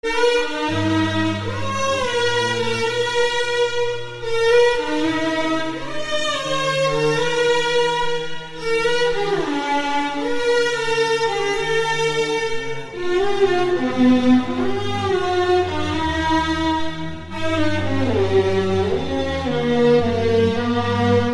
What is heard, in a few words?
Iraqi Music